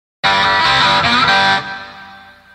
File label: riff; sm64coopdx; gun-mod